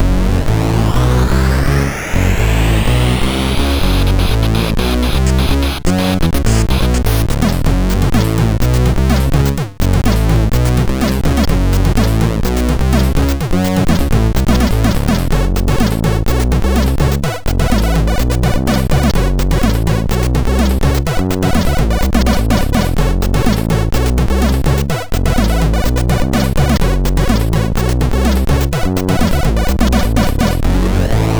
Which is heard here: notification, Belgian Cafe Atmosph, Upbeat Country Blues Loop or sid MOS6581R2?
sid MOS6581R2